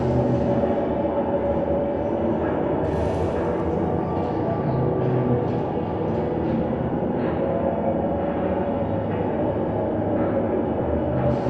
Robot Arm Track Med Dist; Boomtown; Titanfall 2

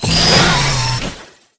Drone Deploy Activate Extend; Titanfall 2

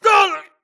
grunt death quick; Titanfall 2

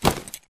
Grunt Mvmt FOL Loud Short; Titanfall 2

